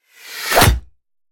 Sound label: miss, combat, ranger; A succubus is born